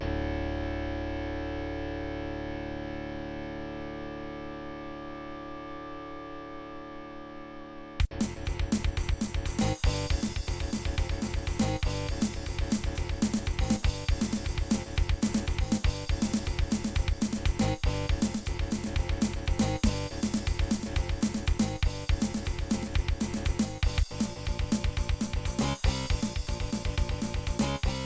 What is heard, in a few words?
logitech soundman wave; Soundcard test page; Doom